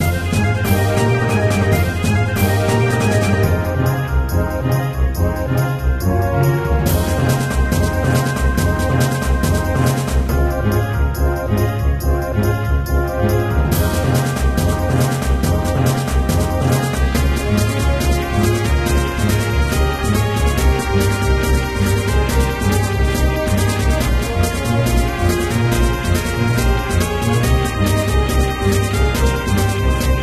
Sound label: Battle; MapleShrine